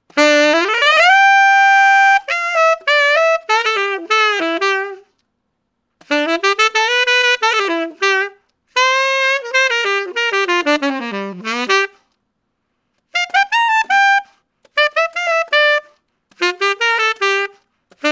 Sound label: Altissimo; SYOS